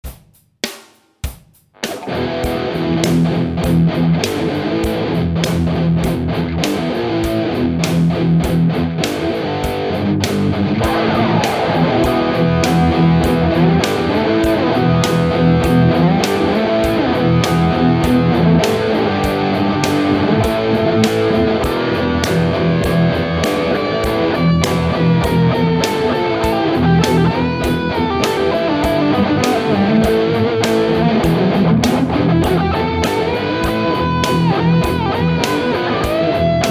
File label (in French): disto; schecter; sunset custom